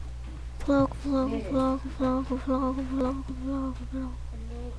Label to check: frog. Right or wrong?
Right